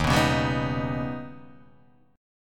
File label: Eb Augmented 7th Eb down, Guitar Half Step Down; Eb+7 strummed; Eb+7 chord; JGuitar